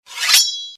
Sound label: sword draw sound